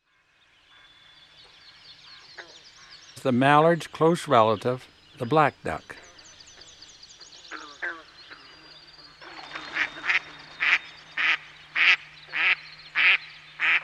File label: Black Duck; Eastern North America; Dan Gibson; Wildlife Identification By Sound